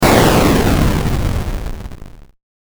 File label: bomb